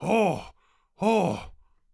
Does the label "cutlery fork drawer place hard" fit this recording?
No